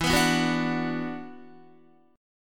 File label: E 7th Suspended 2nd, down, Guitar; E7sus2; strummed